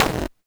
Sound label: polyvox snare